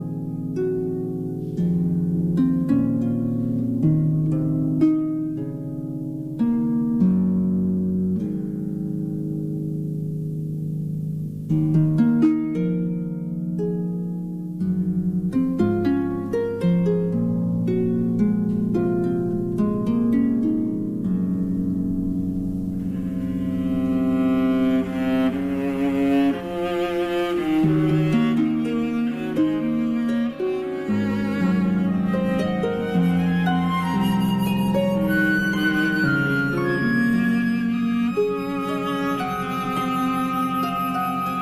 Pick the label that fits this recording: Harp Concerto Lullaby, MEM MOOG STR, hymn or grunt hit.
Harp Concerto Lullaby